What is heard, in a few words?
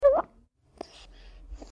Baby Swallows Gurgle